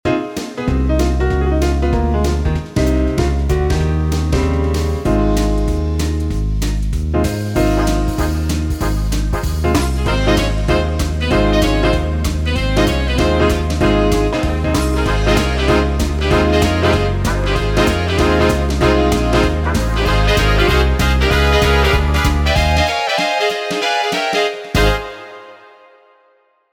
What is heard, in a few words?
big band schnell; Wersi